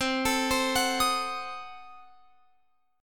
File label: C Diminished 7th C, Guitar Open F; Cdim7 arpeggiated